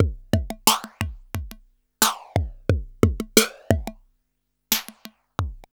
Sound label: FX LP 2, Hip Hop R n B; USB Soundscan vol.19; AKAI